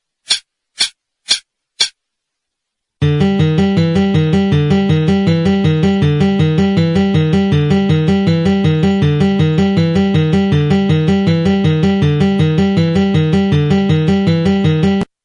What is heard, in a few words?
alternate picking, guitar